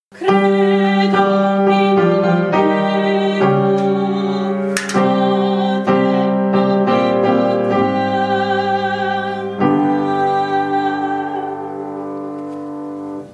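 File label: soprano; credo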